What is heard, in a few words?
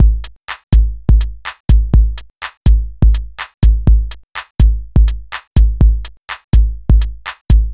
Drum loops, Electro